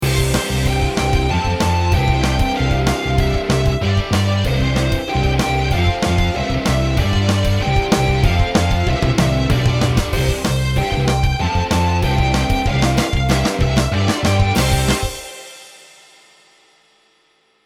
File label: twinkle rock; songwriting